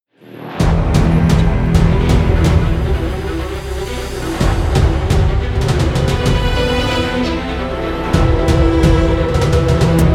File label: epic trailer logo